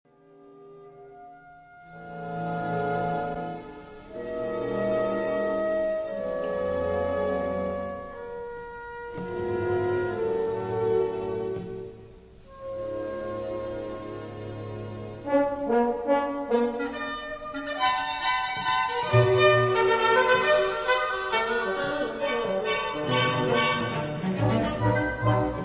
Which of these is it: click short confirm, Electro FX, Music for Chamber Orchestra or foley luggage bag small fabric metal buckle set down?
Music for Chamber Orchestra